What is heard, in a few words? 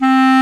Clarinet & Bass Clarinet